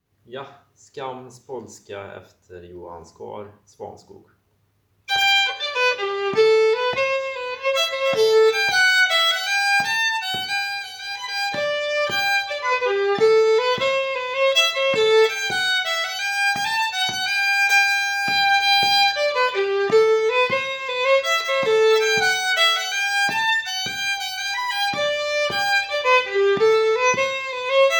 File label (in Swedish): polska, Svanskog; Skams polska efter Johan Skar